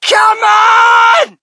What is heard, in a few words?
crowd, shout; Mike Tyson Heavyweight Boxing